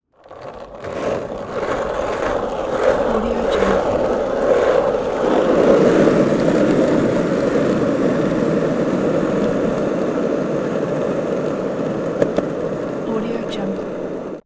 skateboard slow start wheels accelerates; prosoundfx

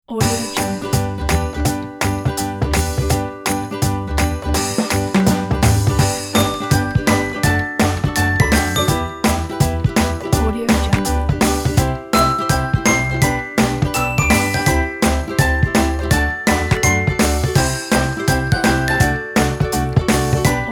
happy acoustic intro

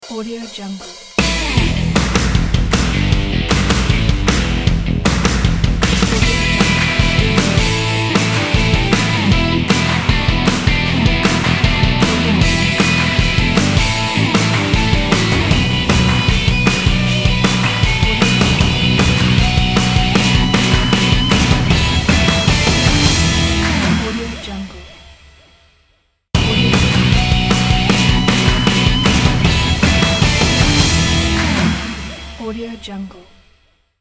upbeat power rock logo

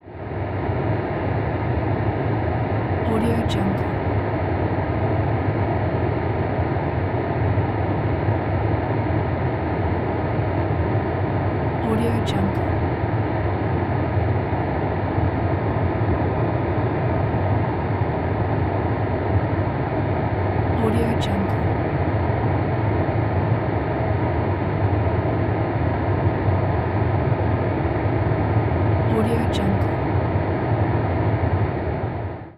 airplane cabin ambience

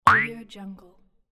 cartoon spring up effect

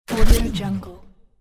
punch plasma laser shot; fxprosound

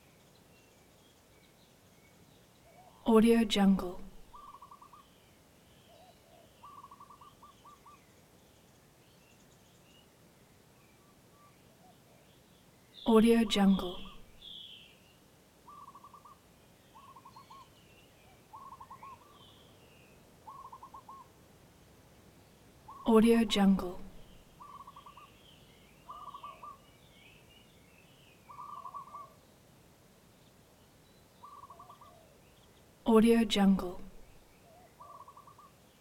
kileau birds

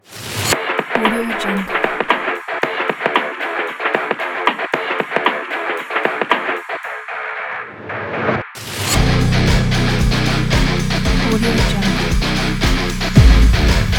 powerful rock

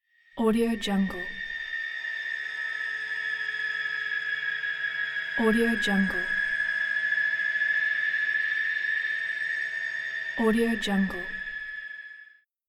dark tone

